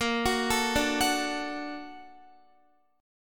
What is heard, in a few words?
Bb Augmented Major 7th down Guitar; Bb+M7 arpeggiated; JGuitar